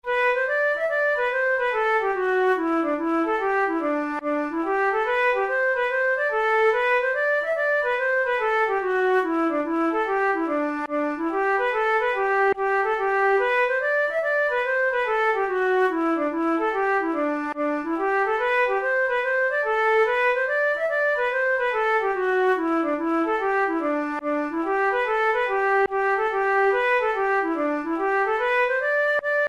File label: hornpipe, flute